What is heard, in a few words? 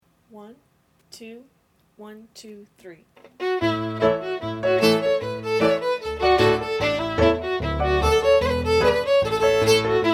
together at tempo; miss wedderburn